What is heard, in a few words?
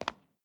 concrete footstep; adams venture origins